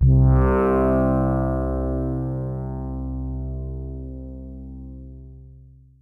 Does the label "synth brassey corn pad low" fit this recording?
Yes